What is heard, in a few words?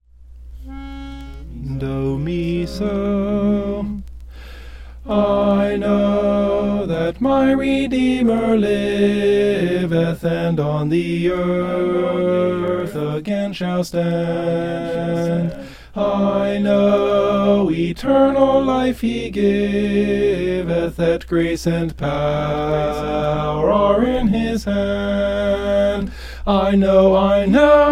soprano; i know that my redeemer liveth